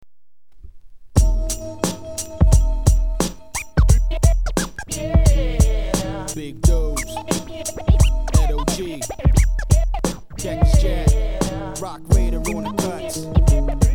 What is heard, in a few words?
hiphop